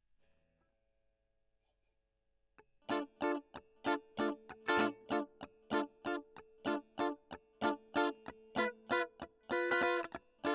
E.GTR Gefell

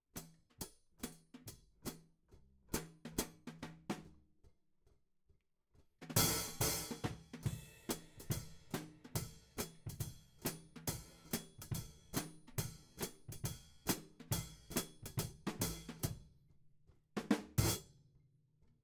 Hat

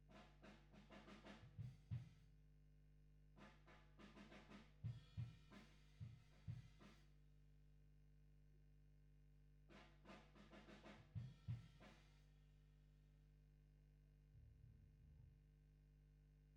GTR B

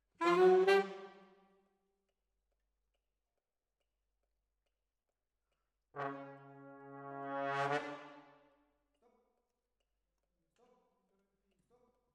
Sax

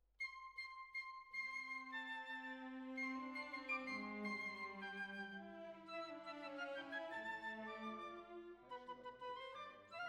Violins Over

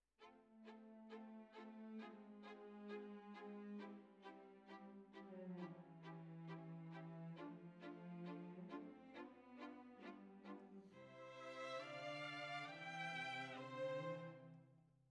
Violins, jazz for piano

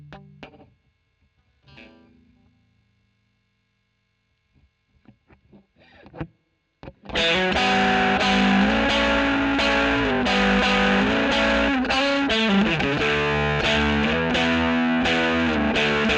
E. GTR Royer OD

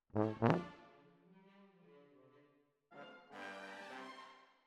Tuba, jazz for piano

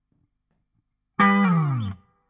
Guit Solo Back

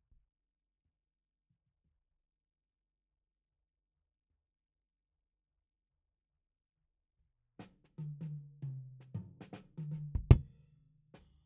Kick In

